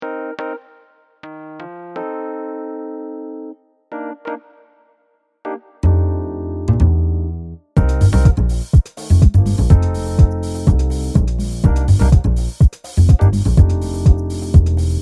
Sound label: Viva Latina Remix